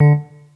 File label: Synth